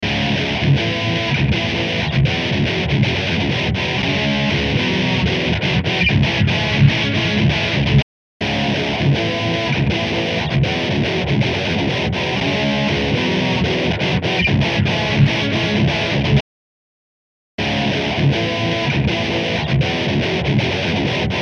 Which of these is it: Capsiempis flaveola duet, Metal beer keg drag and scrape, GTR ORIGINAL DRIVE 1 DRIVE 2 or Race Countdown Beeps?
GTR ORIGINAL DRIVE 1 DRIVE 2